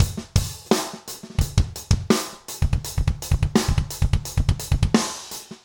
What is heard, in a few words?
Metal Drum Loop